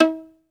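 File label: solo violin, Synclavier Strings; ILIO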